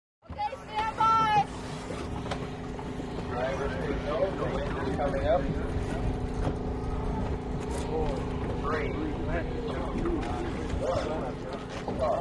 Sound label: Boat Race Start Amb, motorboats